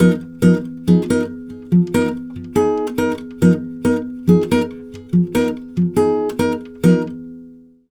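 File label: 140 guitar F diminished, brazil bossa; USB Soundscan; AKAI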